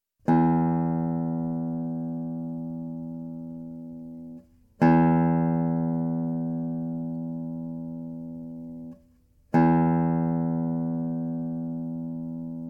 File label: Tuning; classical guitar